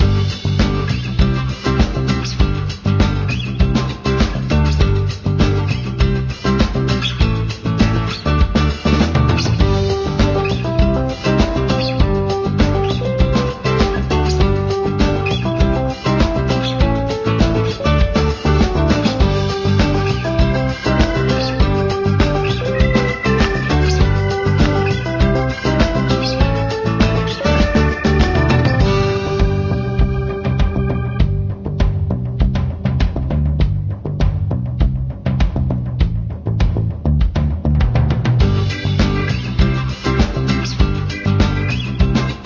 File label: Level Theme